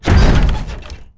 collide; Starsiege

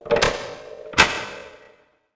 door unlock; Hellboy

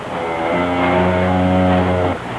cow long moo, farm animals; Sound Effects for Multimedia - Volume 1

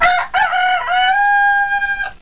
ROOST

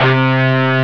Over Guitar (C2); Flashback

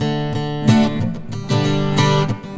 Pop Action, Acoustic Guitars; ACID MUSIC

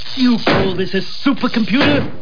sing; Mission To McDonaldLand

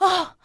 Hit

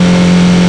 CAR; NFS3 Cars

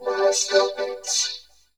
vocal fx; Techno; Sound Clips 10,000

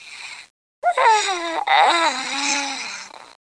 Hi Yawn; The Pea; SoftKey

